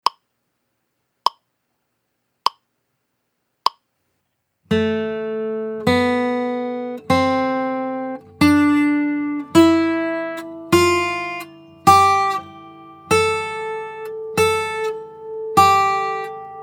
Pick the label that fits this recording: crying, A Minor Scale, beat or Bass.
A Minor Scale